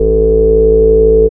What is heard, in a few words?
BASS; E-Lab; X Static Goldmine 1